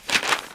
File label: stamp